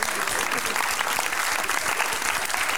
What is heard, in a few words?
clap